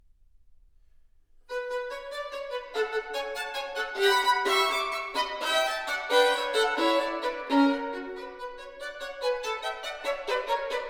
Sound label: Violin Sonata in B Minor, Prestissimo; Op. 42 No. 3